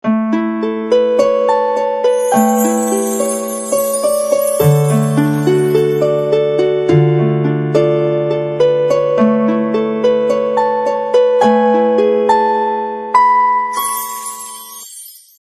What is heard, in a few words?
Soft harp, phonetones; Sony Ericsson; C902